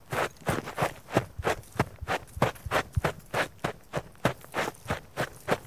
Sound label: Warm up run